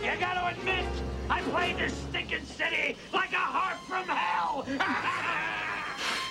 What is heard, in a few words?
harp; from hell